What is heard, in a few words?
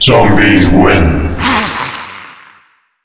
win zombie; nst zombie